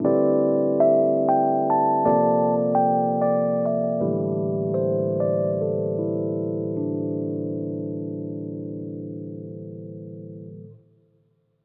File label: E. Piano